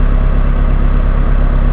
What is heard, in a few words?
tank move